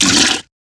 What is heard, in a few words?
poop; soundscrate